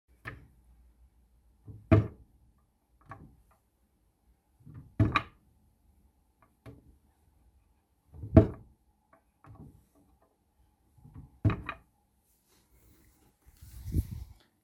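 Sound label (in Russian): откидные дверцы бюро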